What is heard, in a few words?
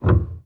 piano release